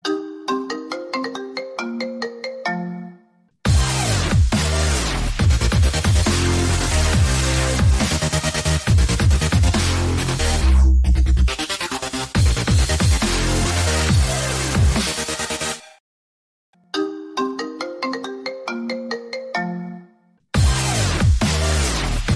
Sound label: iPhone Call Remix, ringtones